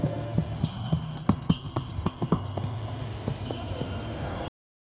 incense crackles softly, bells

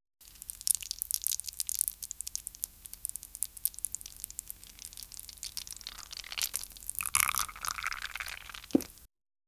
generate a realistic asmr, cosmetics

